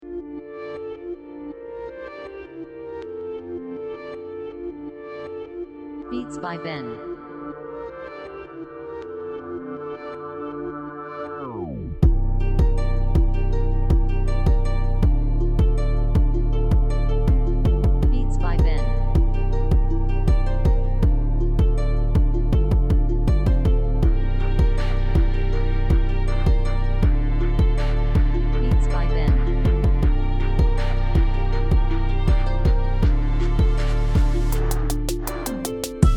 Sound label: type beat